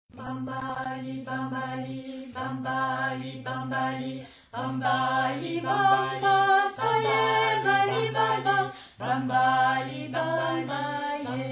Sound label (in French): bambali chant